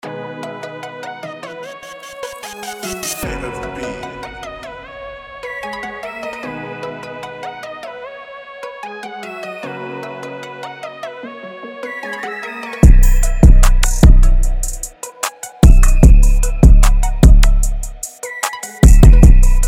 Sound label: beats